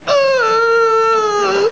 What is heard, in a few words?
URGH